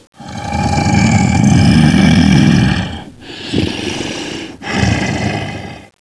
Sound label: tank idle, zombie idle